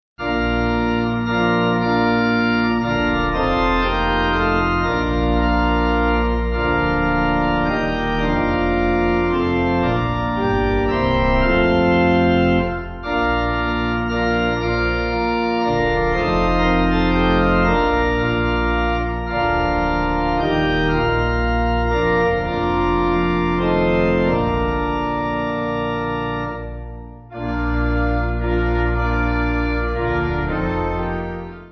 In The Cross Of Christ, Pipe

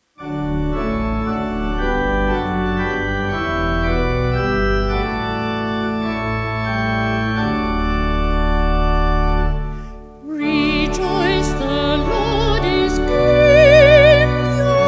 Voice Organ; Rejoice The Lord; Darwall

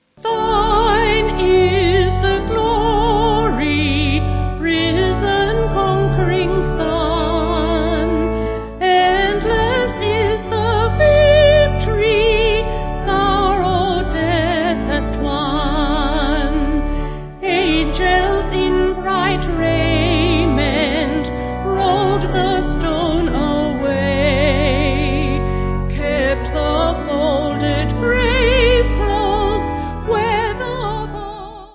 Vocal Organ

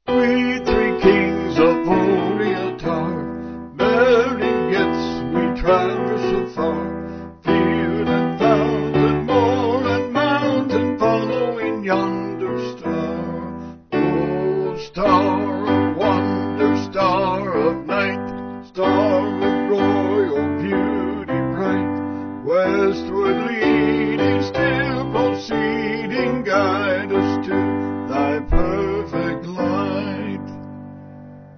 We Three Kings Of Orient, Vocal Piano

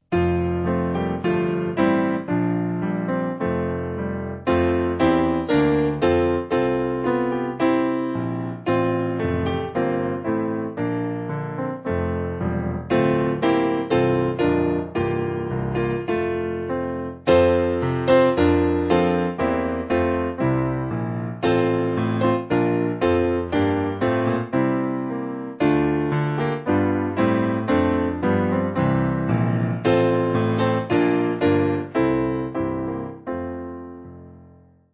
See Amid The Winters, Piano; Humility

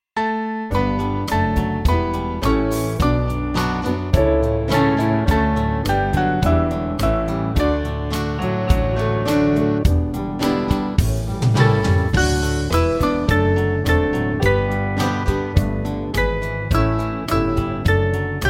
Now Yield We Thanks, Darmstadt, Band

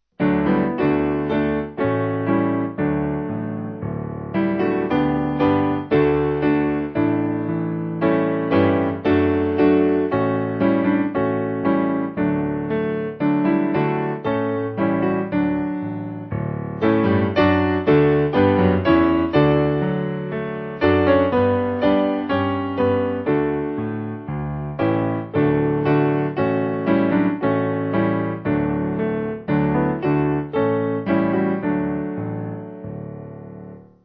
This Is My Fathers, Terra Patris, Piano

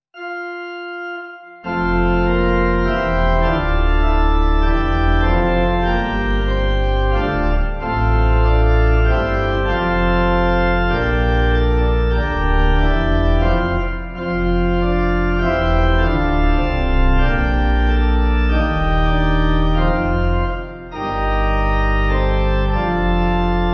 Kyrie, Pipe; Merbecke; Communion Service (1940/1982 Hymnal-USA)

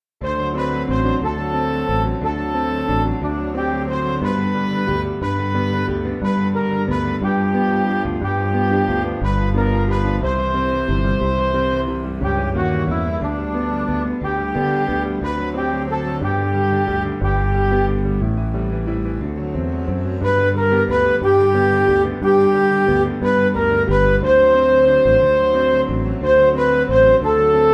Look At The Birds, Midi; De Venter

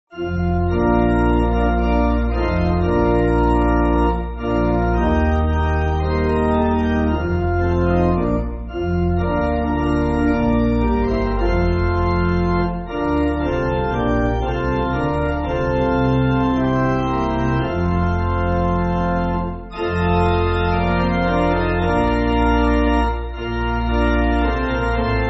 Pipe Organ, Backing Files for Churches; O Father Bless Madagascar; Malan

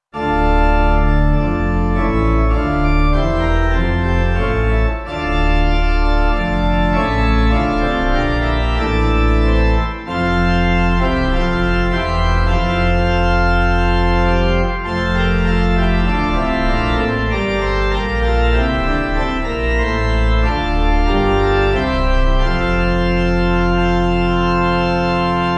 Sanctus Pipe; Communion; Dudman

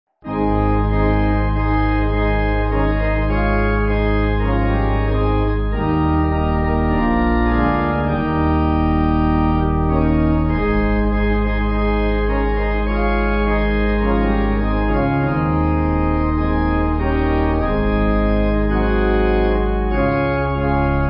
Pipe; There Is No Love

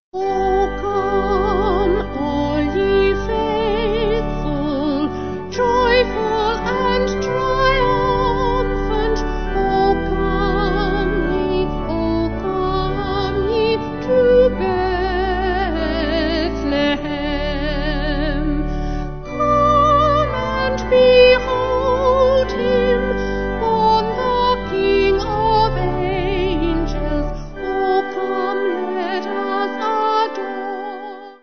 Vocal Organ

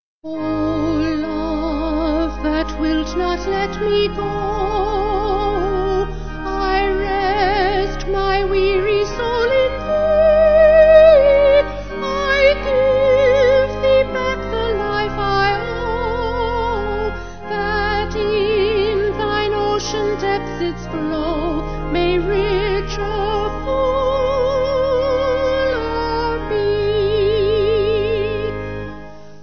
Vocal Organ

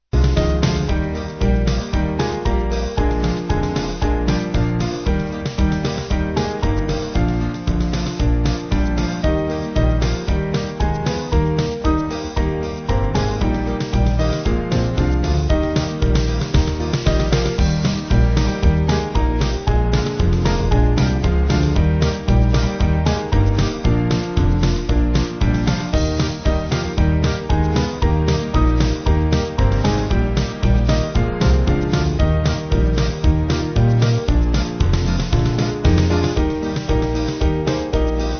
On Jordans Stormy, Band; Promise

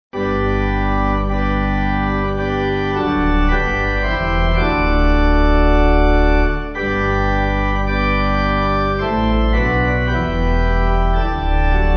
Pipe Organ, Music Backing Files for Churches; Louez Dieu

